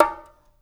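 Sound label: bongo; Spectrasonics; Liquid Grooves 1 & 2; CONGAS+BGOS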